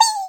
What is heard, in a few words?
slime pweee; slime rancher